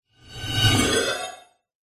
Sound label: science fiction door open slide; zapsplat